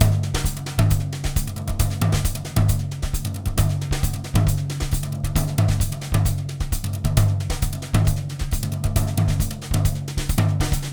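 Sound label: brushes samba; BRUSH LOOPS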